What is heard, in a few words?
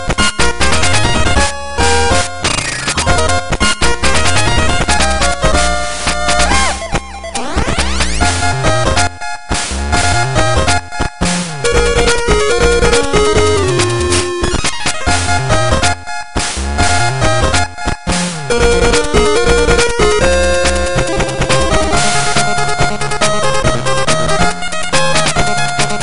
8 Bit